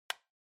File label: Clock Alarm (Button)